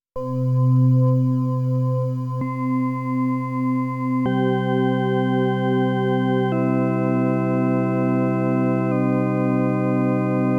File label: Studio Electronics Quadnic Chords